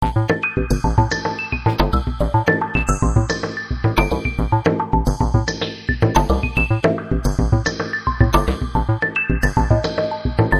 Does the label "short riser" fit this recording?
No